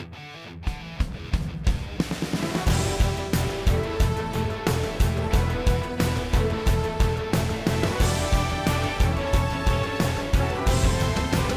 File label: Level Win